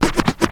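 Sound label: scratch, record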